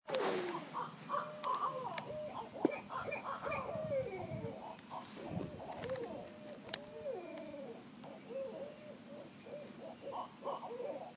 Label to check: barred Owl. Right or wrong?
Right